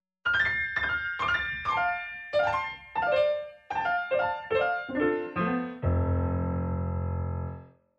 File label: IMPROVISATIONS PIANO